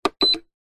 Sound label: household fan plastic button press beep